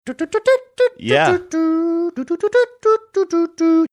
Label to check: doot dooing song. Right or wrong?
Right